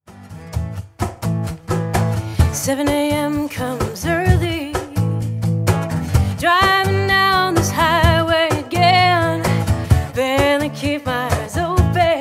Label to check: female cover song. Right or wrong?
Right